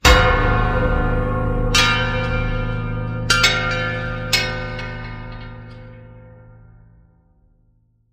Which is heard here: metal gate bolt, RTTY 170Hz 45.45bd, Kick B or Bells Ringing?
Bells Ringing